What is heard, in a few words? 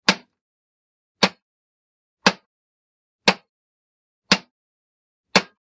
Switches Breaker Old